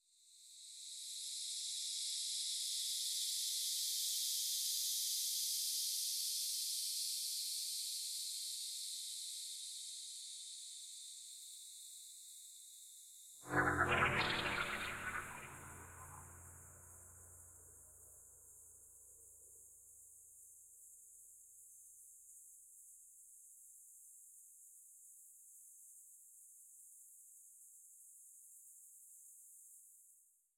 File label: crunch strata